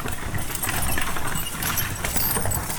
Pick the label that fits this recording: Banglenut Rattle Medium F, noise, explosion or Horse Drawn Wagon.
Horse Drawn Wagon